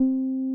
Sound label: TB 303